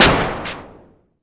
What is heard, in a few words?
mbrute noiz, Arturia MiniBrute